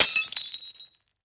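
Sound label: quest cancel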